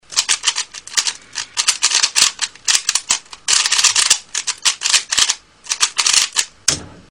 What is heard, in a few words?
Rubiks Cube